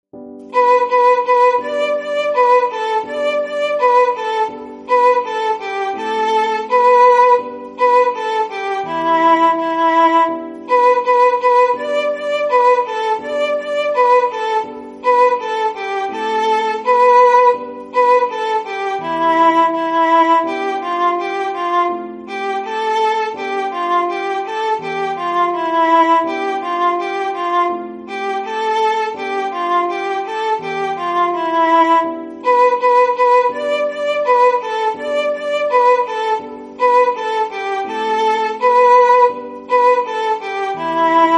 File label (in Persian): ویولن